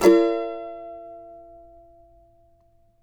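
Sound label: B major, Guitars; Spectrasonics; Hans Zimmer Guitars VOL-2; CAVA CHORDS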